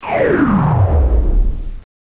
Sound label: fade; cstrike